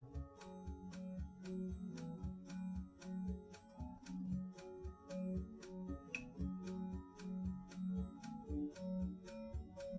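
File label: with drums, live rec; SiSEC11; underdetermined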